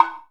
percussion, Ethnic Perc

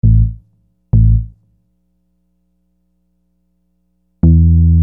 Groove Bass, Hip-Hop Street Beats

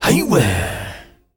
HIEWEH, World Voices, AFRO; ILIO; Vocal Planet VOL-5; AFRO NOISE

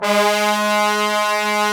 BRS TBNS, Bone Sec ff; Roland L-CDX-03 Disk 2; Bone Sec.FX

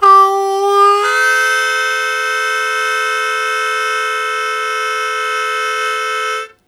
Blues Chord Attack F1, Harmonica; Zero G - Harmonica

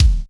Kick, Korg Wavestation

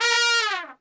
A#2 TRP, Brass; Best Service; Brass Super Section

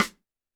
Snare, Roland TD-20

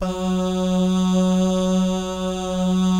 BAH GUY, Vocal Planet Jazz & FX; ILIO; BAHS GUYS

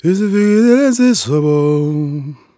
Vocal Chirp, African Voices

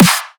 drum snare enhanced; Virtual Riot; Drum Hits

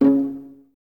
Violas C#3 Pizz; Miroslav Vitous; String Ensembles

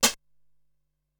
Brushed Snare, Ensoniq SQ-R Plus

